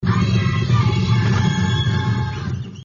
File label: ayayayayayaaaaa